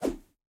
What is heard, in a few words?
Swing Large